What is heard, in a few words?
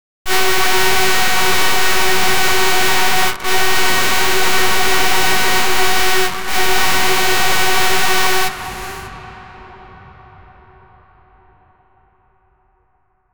firing a nasty large lase; laser